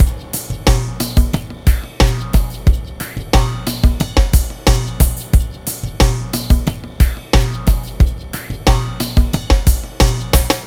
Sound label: High Pressure, чиллаут